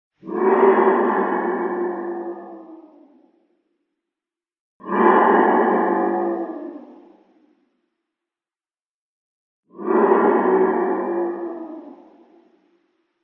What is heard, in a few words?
monster groan or cry distant in cave or dungeon